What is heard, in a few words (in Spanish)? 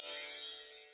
arpa